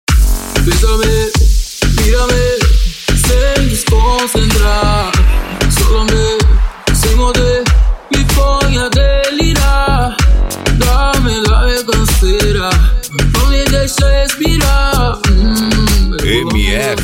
sertanejo remix

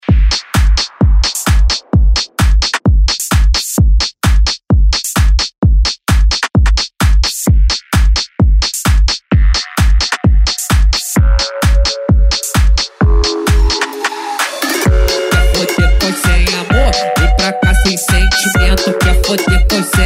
baile funk som automotivo; araguapaz go

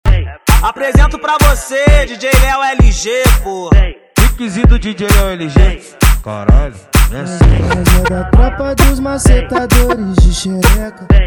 eletrofunk; cd equipe revoada especial fim de ano

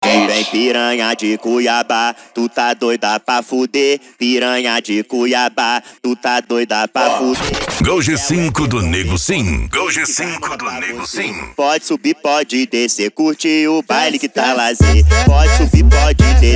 funk mega bass e noia dance

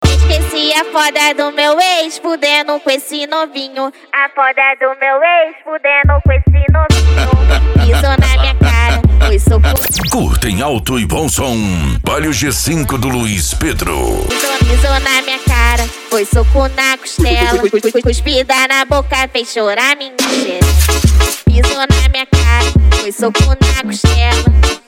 funk mega bass e forro; urupa ro

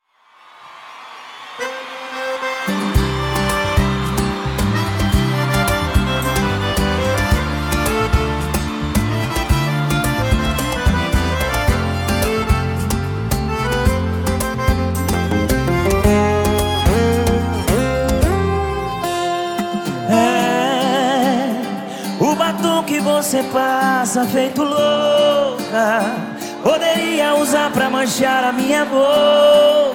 sertanejo sofrencia e romanticas

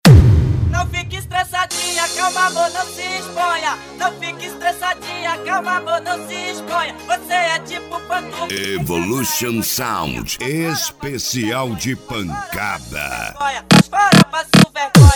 esp de pancada

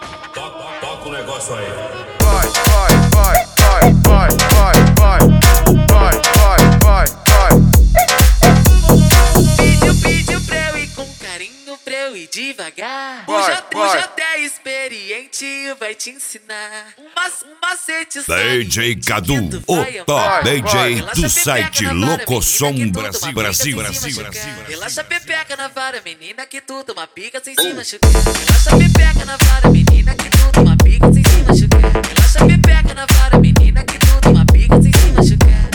mega funk

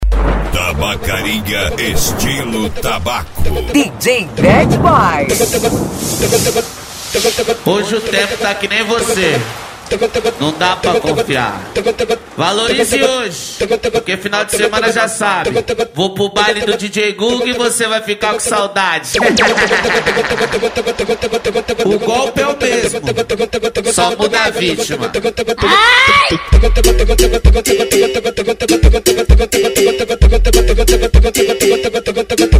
funk conciente e hip hop nacional

